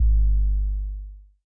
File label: urban trap kick; Urban Trap Kit; Hip Hop; STYLE KITS (Hip Hop, Etc)